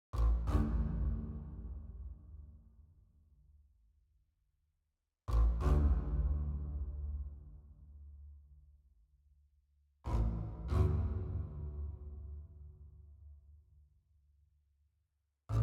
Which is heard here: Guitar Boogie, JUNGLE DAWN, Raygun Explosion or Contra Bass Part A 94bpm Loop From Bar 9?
Contra Bass Part A 94bpm Loop From Bar 9